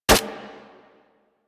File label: Fire Mech, Weapons; Braton Redux; Warframe